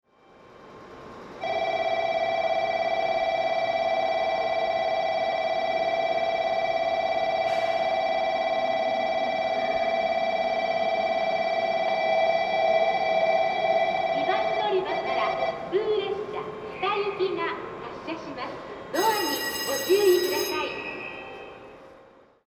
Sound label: bell, station; kokura; jrkyushu